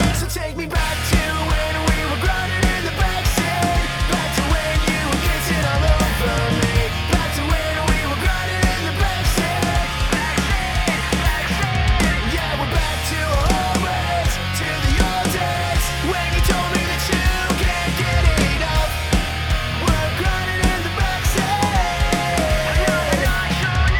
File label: ROCK With FUSER